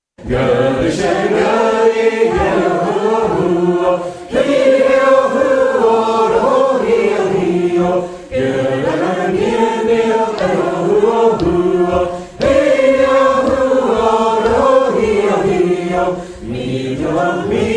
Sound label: Gaelic Choir